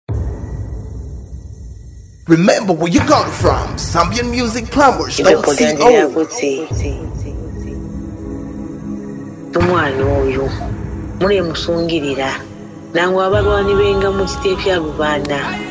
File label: Drums